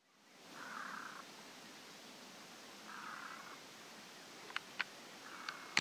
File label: Brown headed Gull Chroicocephalus brunnicephalus, Ladakh